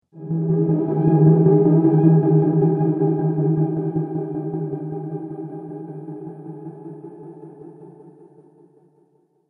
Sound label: Haunting Horror Element, Ghost; Ghost Sound Effects